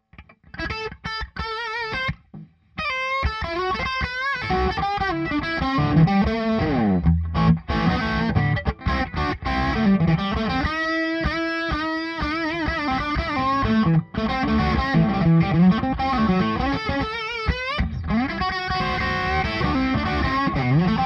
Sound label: Bridge Pup